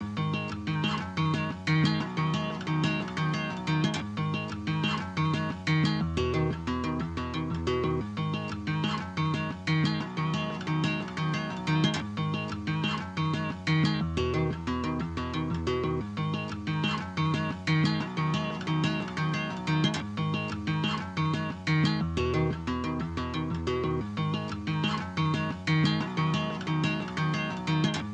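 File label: 120 BPM G# Min Acoustic Guitar; Loop Stems; Cymatics; Mask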